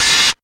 short open hi hat sample a key, Royality free open hat sound tuned to the A note.